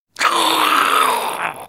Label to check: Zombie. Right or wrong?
Right